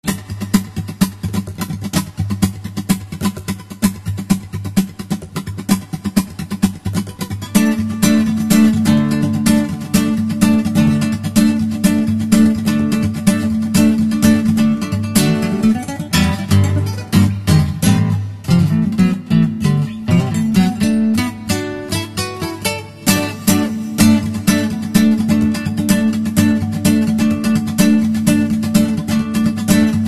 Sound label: acoustic